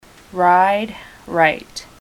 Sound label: ride right